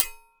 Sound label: shield metal, attack impact